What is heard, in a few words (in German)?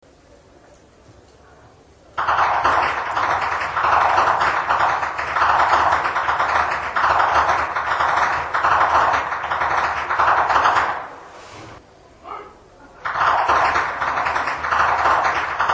schnalzen, flachgau; anthering